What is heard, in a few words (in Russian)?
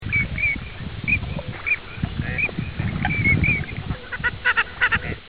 shilohvost, birds